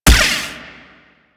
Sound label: Rifle Lancer Gun Shot; Warframe; Narmer